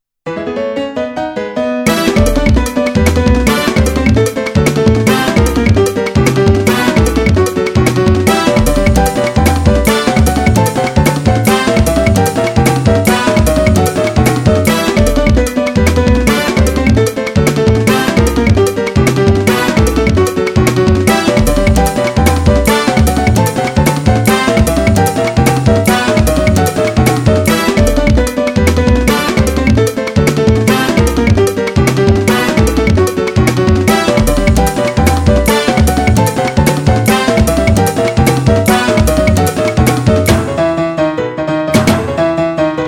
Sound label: salsa